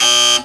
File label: chairs wrong buzzer; musical chairs; birthday party bash; wii